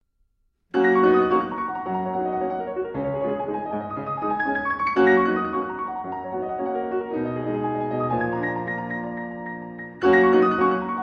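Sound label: Etude op 10 No 5 in G Flat Major Vivace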